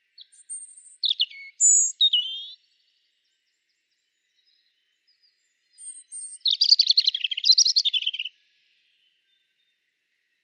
roodborst

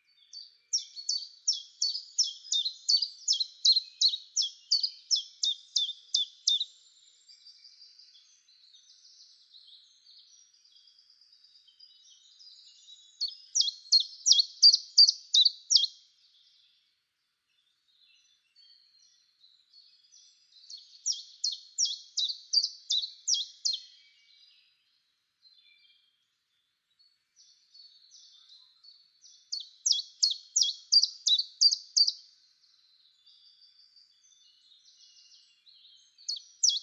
tjiftjaf

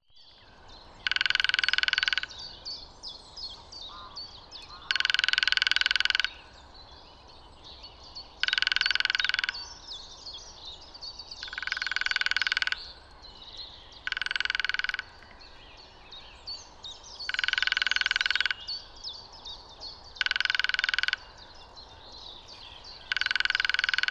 kleine bonte specht

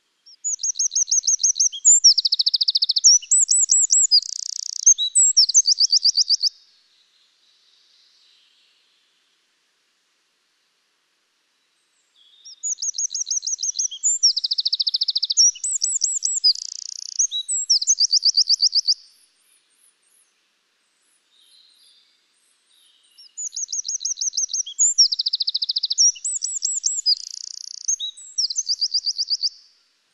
winterkoning

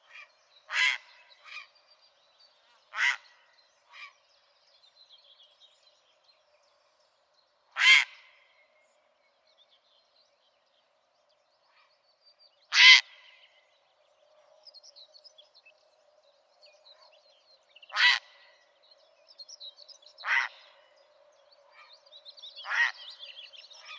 blauwe reiger

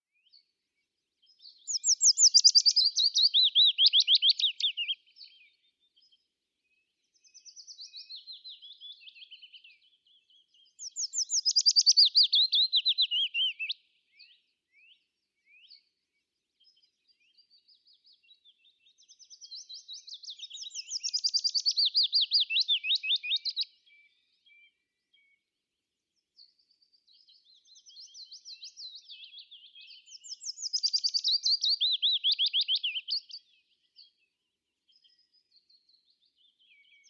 fitis

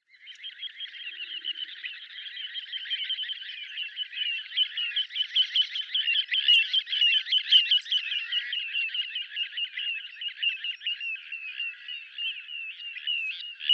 kleine plevier

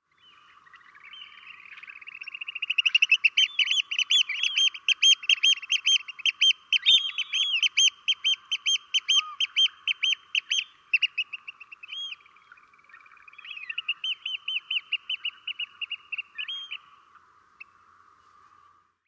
scholekster

